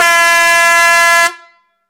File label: air horn